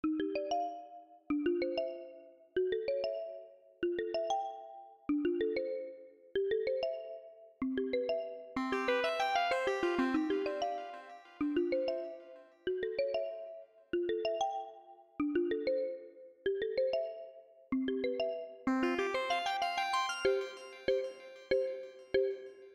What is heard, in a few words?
Clav